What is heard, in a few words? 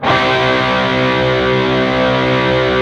PRS PC C#3, PRS PWR CHRD, Guitars